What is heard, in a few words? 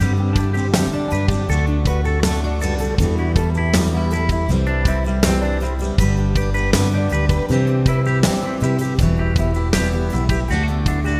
Country Pop 148